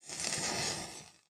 spell fire; RPG

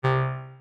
Button Deny, menu

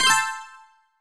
confirm, tones; inteface sfx pack 1

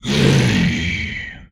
Inhuman Growl, Vocal; retro arcade; MMRetroArcadeSoundsPack

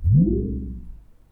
Woom, chairmat; Micro Pack

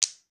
item stone; RPG

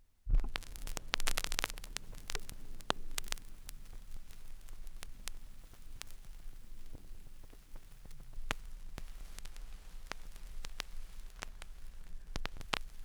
Ambient Noise, record fuzzies; Micro Pack

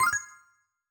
confirm, tones; inteface sfx pack 1

